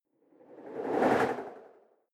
whoosh simple flutter phase

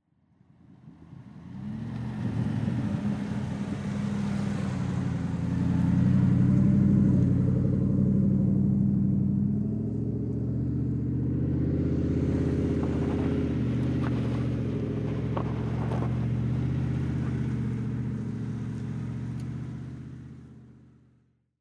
jeep cherokee driving

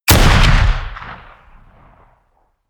shot

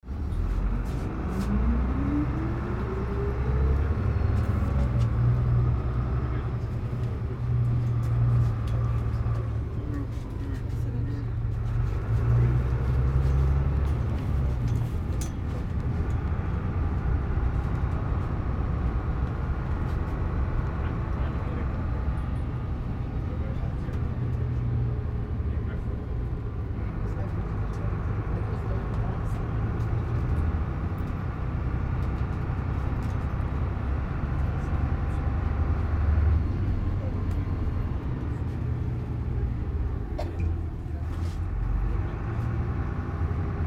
airport bus inside

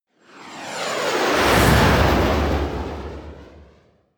military jet fighter flyby whoosh

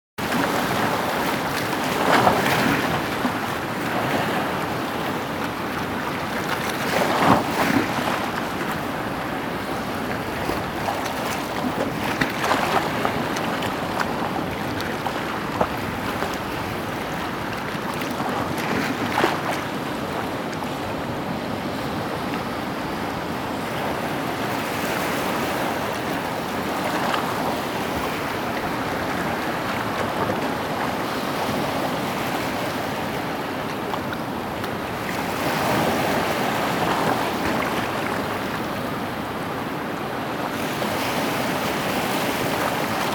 sea with rolling waves

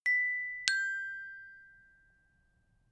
notification glockenspiel third down

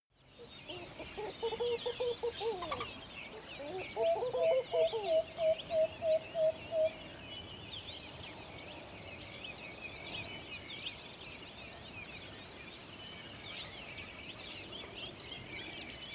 water hole early morning

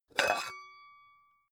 pan open

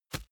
plastic tense bag soft

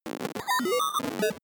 broken computer random fraction

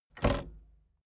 tape deck bounce

